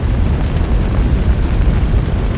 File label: Fire Furnace Blaze Loop; Destruction